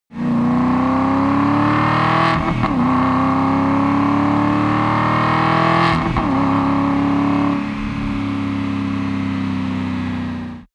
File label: car aston martin driving deceleration